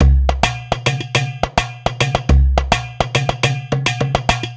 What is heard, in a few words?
105 Hip Hop World Percussion; Digidesign; Pro Tools; Pro Tools Essential Loops